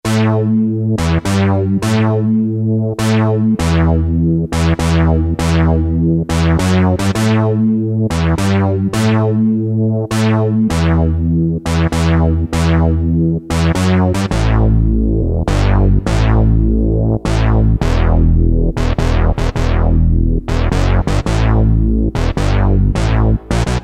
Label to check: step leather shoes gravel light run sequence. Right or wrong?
Wrong